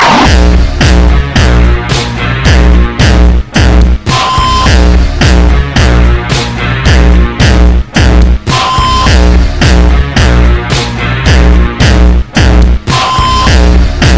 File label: Bounce Rock